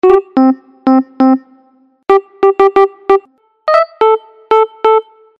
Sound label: notification ringtone; iphone sms ringtones